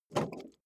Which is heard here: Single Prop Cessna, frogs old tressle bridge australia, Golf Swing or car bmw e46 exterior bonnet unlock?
car bmw e46 exterior bonnet unlock